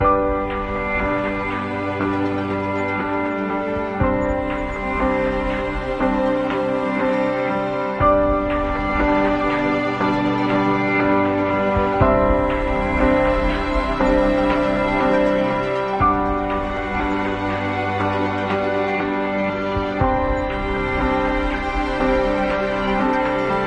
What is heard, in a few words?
Ambient